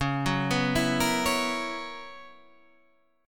B Major 7th Suspended 2nd Suspended 4th C sharp, chord shape